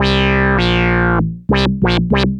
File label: ARP Odyssey, Arp A Line, synth samples